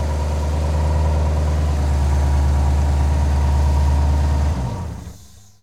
Ferrari 355 Off, Automobiles

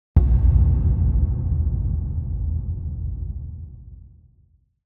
Impact Drum Dull Low Wooden Reverberant Hit; Hollywood Edge; sound designer's toolkit v2

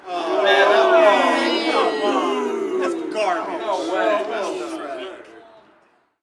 street ball crowd boo; SFX Kit; Crowds

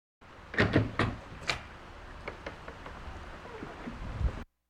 Animal effects snorts grunts farm ambience

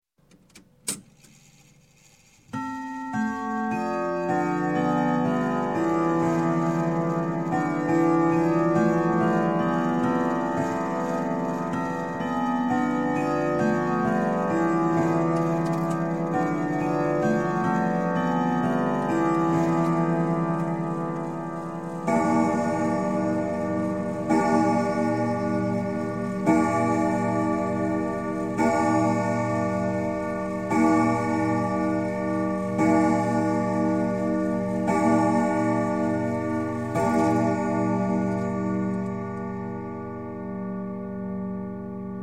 Clock, Grandfather Whittington Chime - Strike; Strike Eight; Sound Ideas 2000 Series

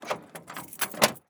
Semi Truck Mac; Blastwave

